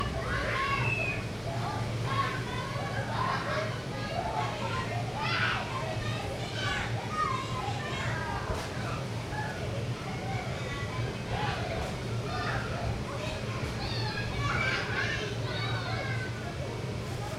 Kids In Bouncy

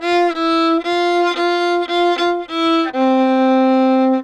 Violin Bit

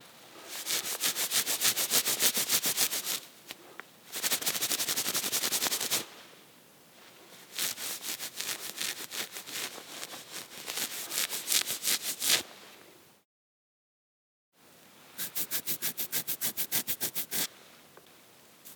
Scratching

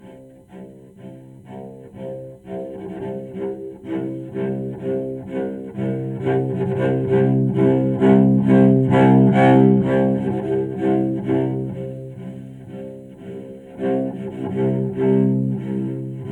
Cello Play C

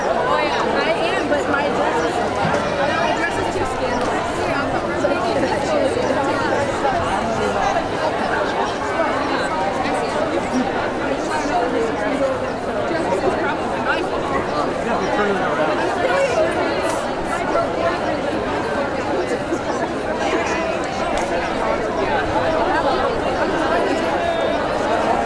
Large Crowd At An Outdoor Reception

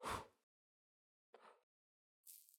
Candle Blow

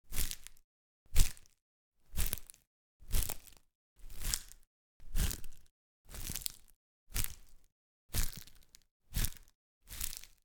Fast Crushing Crunching Bones Wood

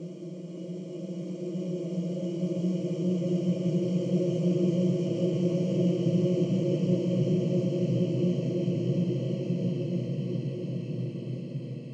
Dark Ambience

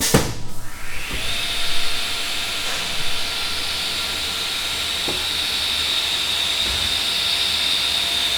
Saw Switched On

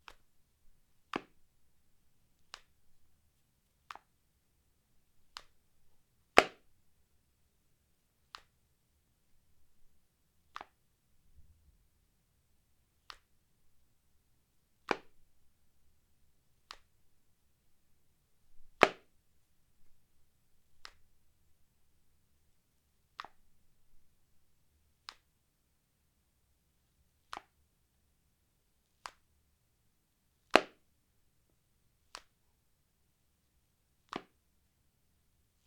Ring Box Open And Close

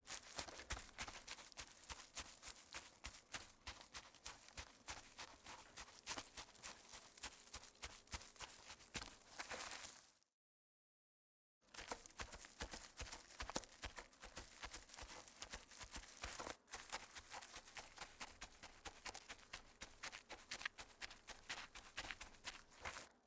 Animal Footsteps

